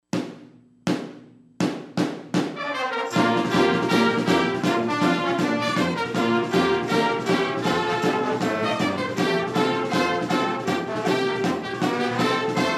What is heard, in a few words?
PEP BAND FIGHT SONG